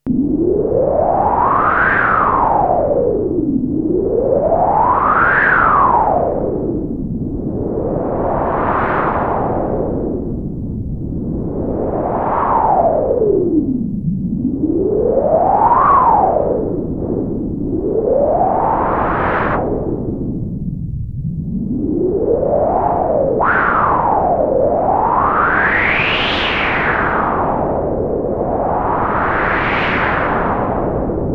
WIND, Roland SH-101; Factory Patches

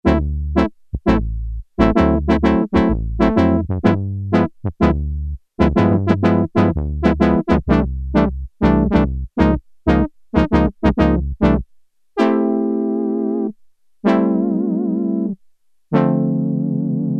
Brass

